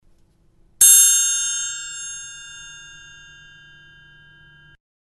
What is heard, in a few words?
Triangle Ringing